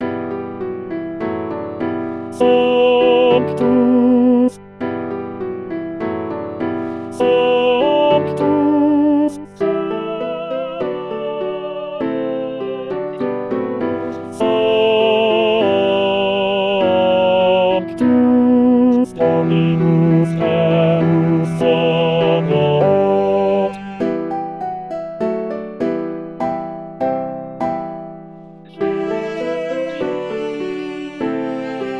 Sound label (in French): basse sanctus; basse 2